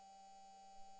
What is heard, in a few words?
mic, mars2020 edlcam